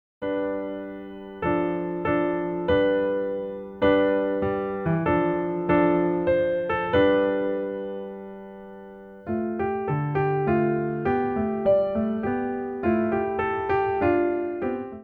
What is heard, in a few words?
Snow White Keys